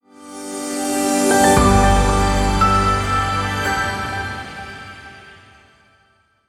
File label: Revealing Music Logo